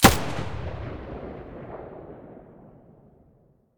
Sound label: muzzle; weapons; pp1901; vityaz